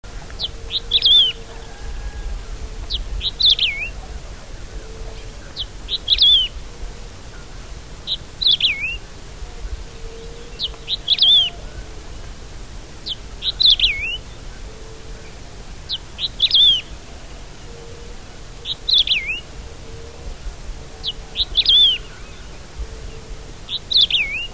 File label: Emberizoides herbicola